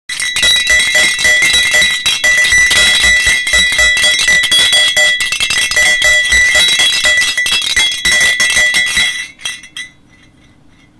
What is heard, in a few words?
noisemaker; Mallet style; note wooden handle; Halloween noisemakers; Kirchhof